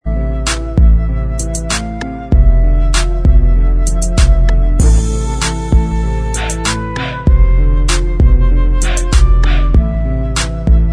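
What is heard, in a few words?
instrumental, backing track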